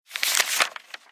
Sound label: paper flip